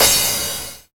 Crash Falling, Deep House; Ghosthack; Advent Calendar 2019

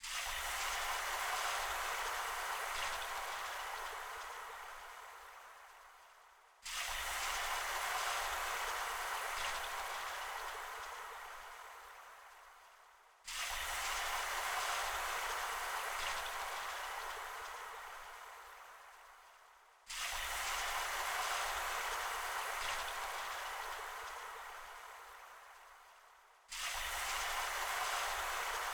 145 BPM D# Min Noise, Analog; Melodies; Loop Stems; Cymatics; GHOST (Beta Pack); Emerald

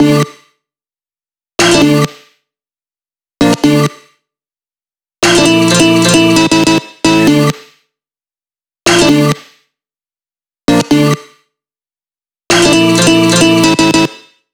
D Min 132 BPM Loop Resample, Melody Loops; Loop Stems; Cymatics; Vanquished

